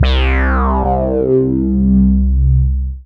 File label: Single Electric Basses, Dance Floor Bass